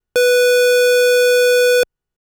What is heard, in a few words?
Sub B6, keys; fleet keys Samples